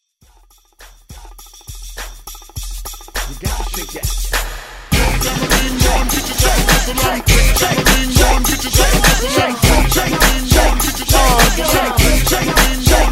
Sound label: House Transition